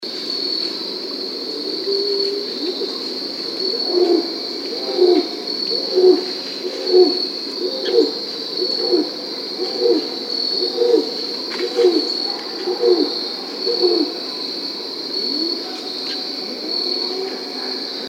Cattle

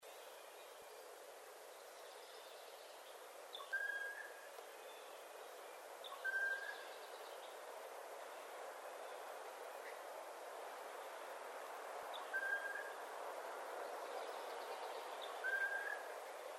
mystery bird

